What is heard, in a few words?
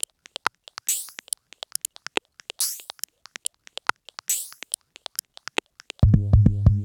Op1 Perc Loop; Vignette Kit